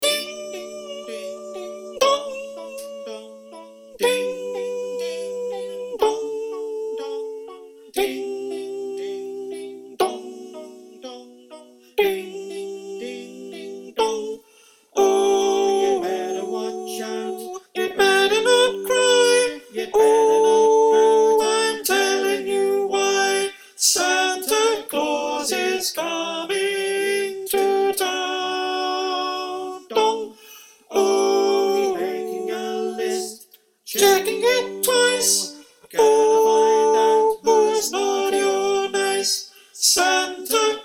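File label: Soprano, choir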